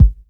studio bass drum g sharp key, kick sound tuned to the G# note. Loudest frequency: 89Hz